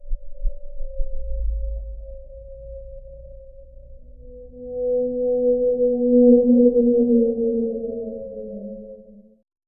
haunting alien moan low, moan

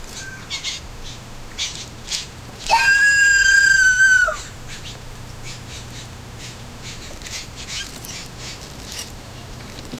loud sobbing baby raccoon; heavy breathing

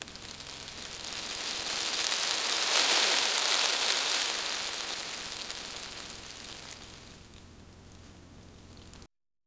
fruits rolling on dusty g, fruit

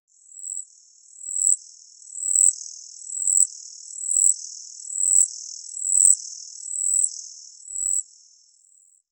delicate hummingbird wings

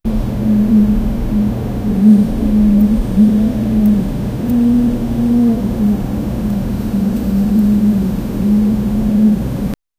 monkey sobbing softly

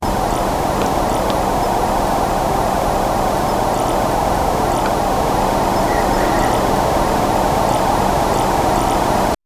british night time forest; flickering

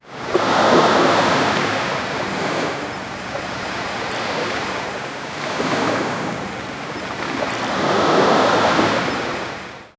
ocean light waves touchin

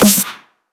sniper shot; rifle

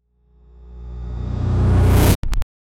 whoosh

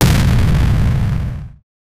rocket missile launch sound

